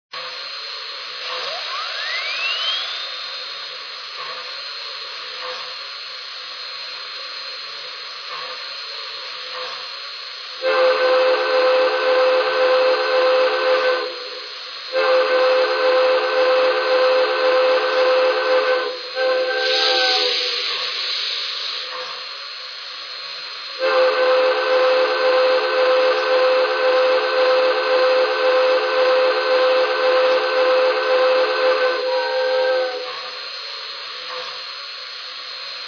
hudson; lv

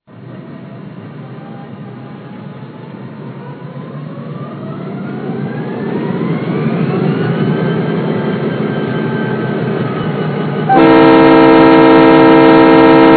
GP-35

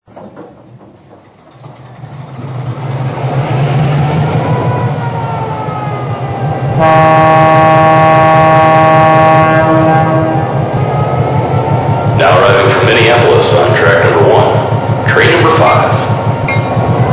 EMD F3

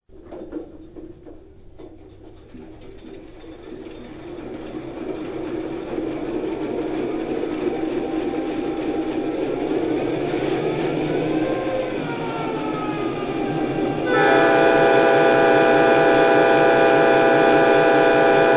EMD GP9

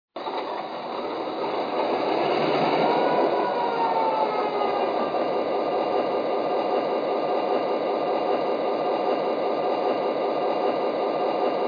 F3 ABA

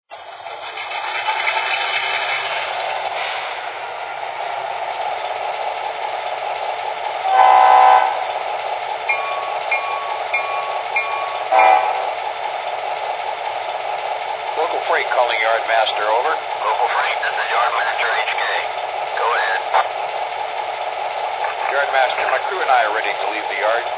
sw1500, trains

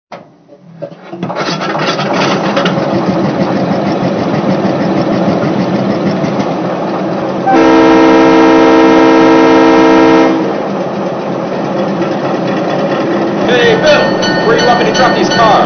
GE 44Ton

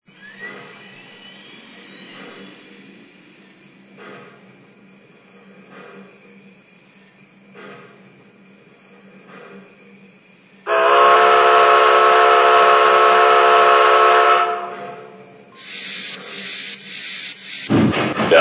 462 Pacific; CHS

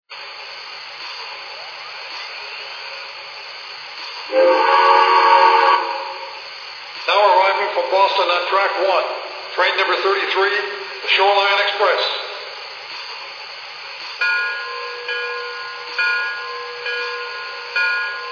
hudson

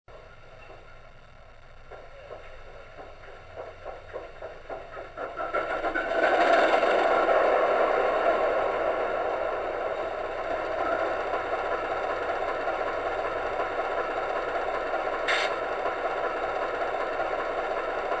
VO1000

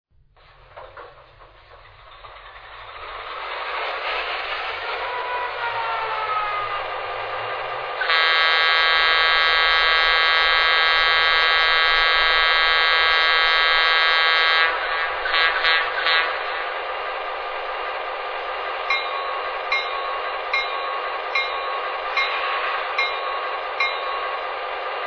F3A, trains

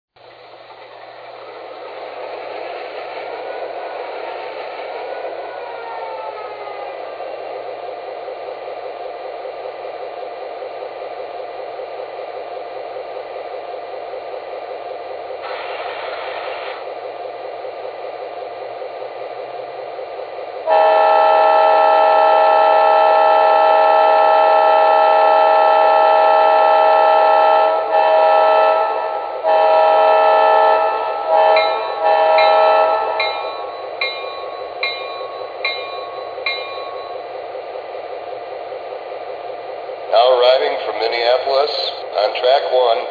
e8, trains; ris

